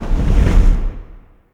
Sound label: flap